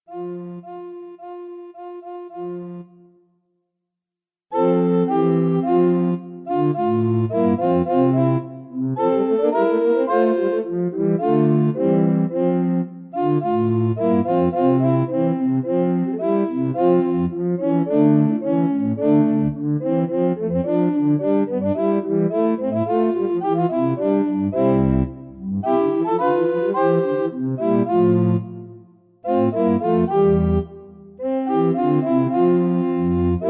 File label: air trompette; telemann georg philipp